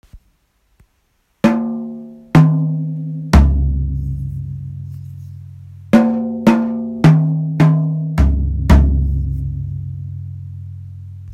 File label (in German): schlagzeug toms stimmen